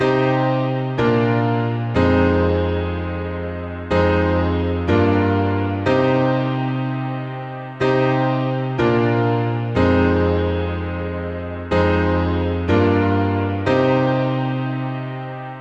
Piano Verse C Wet

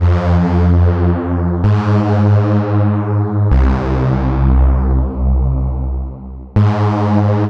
Bass Loop F 128

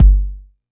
Kick Analog Mono

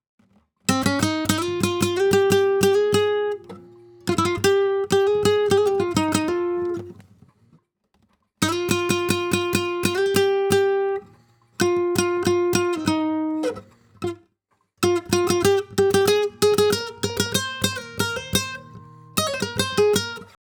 Flamenco Session Two Guitar Dry 94bpm Gm

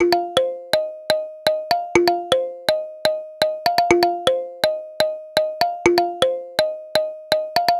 Synth 123bpm D- Kalimba

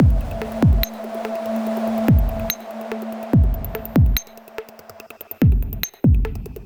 Reggae Microbe G, 72

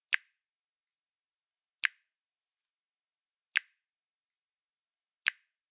Snap Single No Reverb Half Notes 70BPM

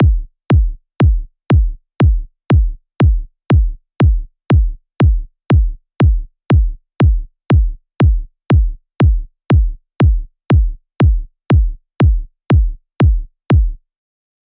Kick Bm 120 BPM

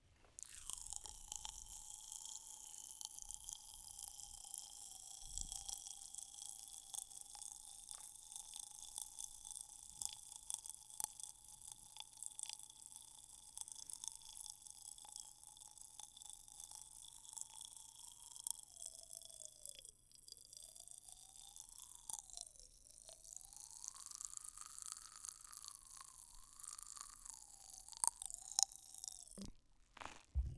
mouth pop rocks wide

